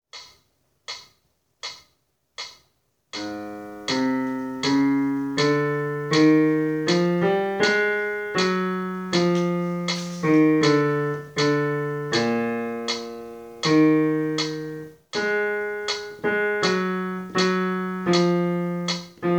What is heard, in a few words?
Bass